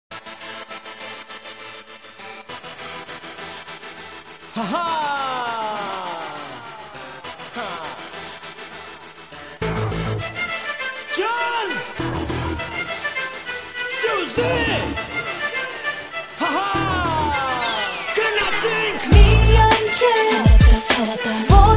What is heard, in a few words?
Armenian rap; hip hop